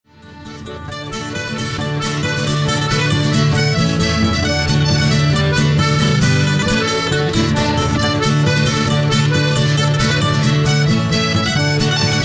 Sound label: Irish Polka